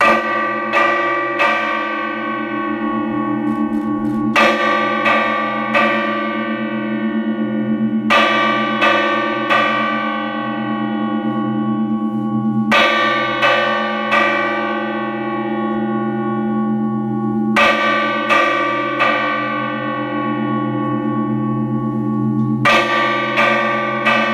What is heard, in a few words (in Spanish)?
leon toque fuego